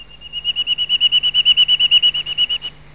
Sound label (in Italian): Picchio muratore corso